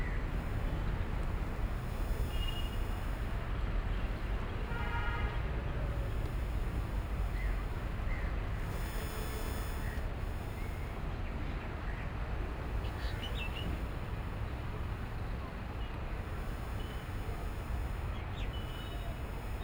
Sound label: Namo Buddha Rooftop, Boudha, Kathmandu, Nepal, fieldrecording